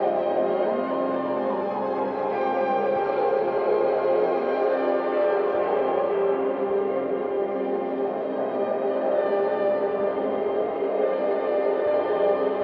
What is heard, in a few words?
Tonal Swarm 76 BPM C Min, FX; LostInSpace; Cymatics; GHOST (Beta Pack)